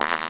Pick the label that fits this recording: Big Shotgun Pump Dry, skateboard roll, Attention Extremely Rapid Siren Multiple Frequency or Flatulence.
Flatulence